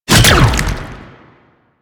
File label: Hand Bomber Proj Fire; Warframe; Man In The Wall